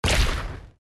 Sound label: Ink Explode